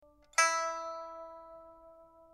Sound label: pipa; chuYinKouXian